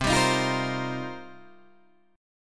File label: C Minor 11th C Guitar DADGAD; Cm11 strummed